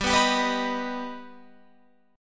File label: G Augmented G Guitar Open F; G+ strummed; JGuitar